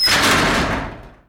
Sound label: metal break; big bug